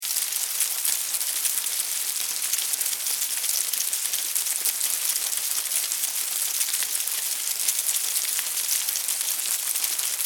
Hive Movement, Animal, Hive, Movement, Heavy, Activity, Insects, Bugs; 5.1; Surround Sound; BlastWave